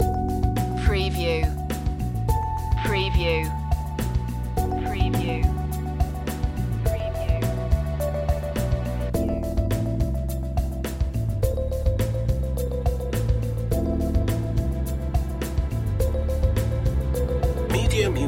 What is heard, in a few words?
acid jazz loop